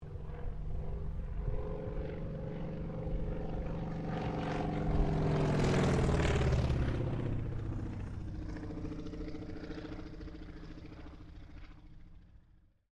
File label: AIRCRAFT CAC WIRRAWAY PASS; CA-16 Wirraway; Airplane; Airplanes; Vehicles